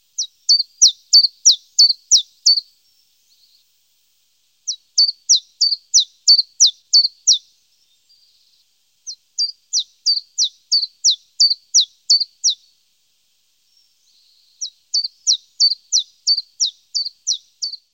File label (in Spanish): mosquitero comun